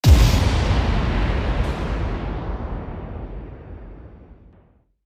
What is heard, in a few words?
IMPACT